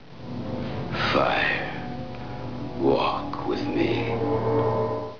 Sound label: firewalk